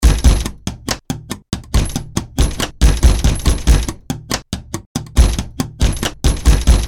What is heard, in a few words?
table tops